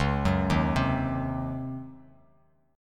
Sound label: Db Major 7th Suspended 2nd Suspended 4th, Bass Standard; 9,9,1,5; arpeggiated; chord shape